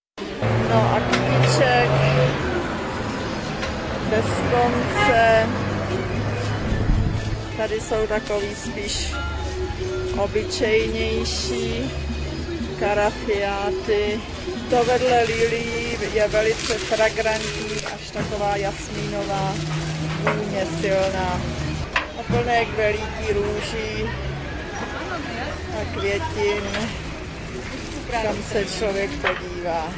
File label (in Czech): Quito trh kvetiny; Video Ekvador